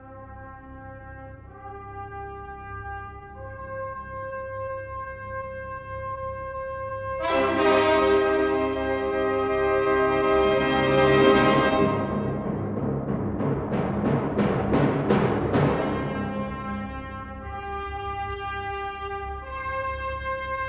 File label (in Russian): Фанфары